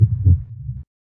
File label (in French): Battements de coeur